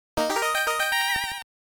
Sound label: Bonus Game Success; the cowabunga collection